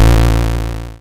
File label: Thump sms